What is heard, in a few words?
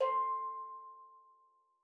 C4, STEELDRUMS; Xsample VOL-4